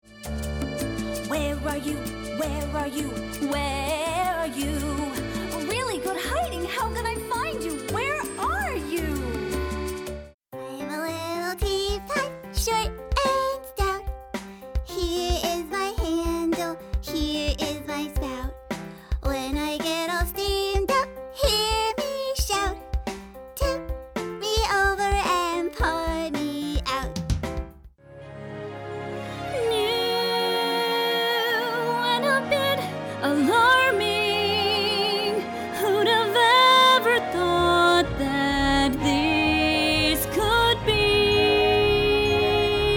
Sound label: Kids Music Singing